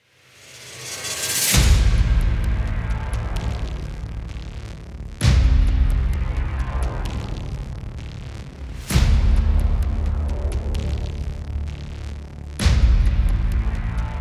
Storm Before The Calm

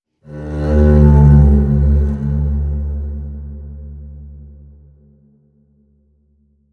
Contrabass

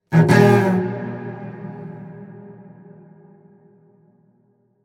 Cello

